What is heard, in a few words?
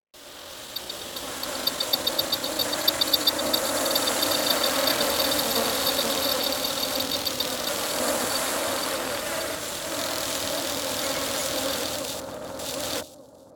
insect